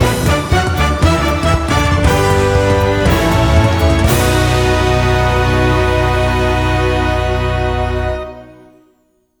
JINGLE BALAN GAME RESULT SUCCESS

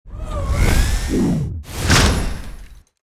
Sound Lariat; Makobo

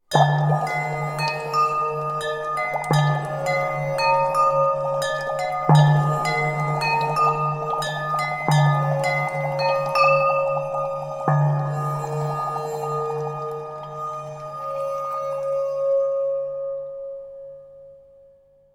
village salamander night; curious expedition 2 macos ps4 switch windows xbox one xbox series xs gamerip 2021

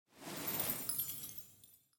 Desert Rain

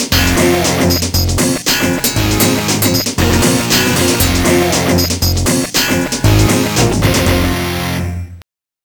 Touchdown; John Madden Football; Amiga

